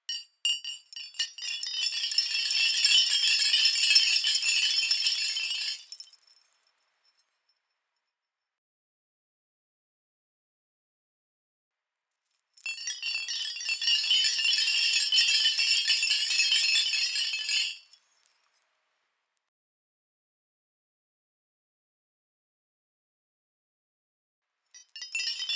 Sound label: Chandelier